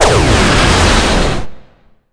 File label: explosive resist